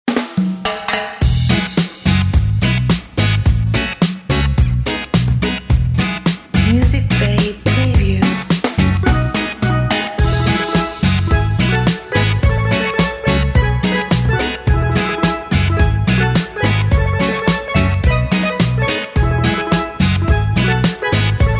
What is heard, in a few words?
ocean reggae